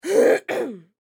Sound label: Human Female Cough Clear Throat; Clearing Throat